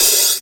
Cymbals HH Open; Vengeance Samples

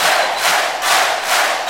Crowds; Vengeance Samples; VEC2 FX

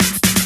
Fill Ins 128BPM; Vengeance Samples; VEH2 Loops

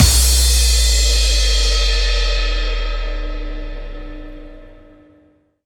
Crash, Cymbals; Vengeance Samples; VEH2

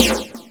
snare fx; Breaks N Beats Sound Kit; Beatz Kit

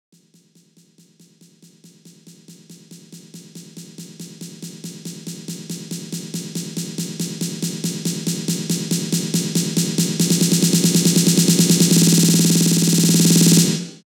140BPM SNARE 8 Bars; Identity; Vengeance Samples; Snares&FX Pairs

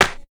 clp, claps, drum perc; house; PS-28 Vocal House Samples

